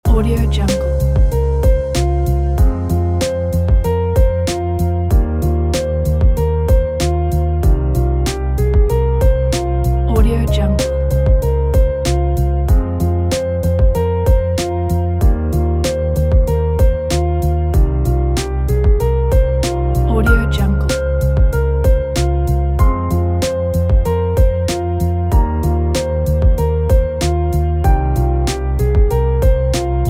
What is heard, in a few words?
hiphop piano loop